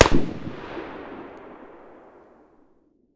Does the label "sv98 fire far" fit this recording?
Yes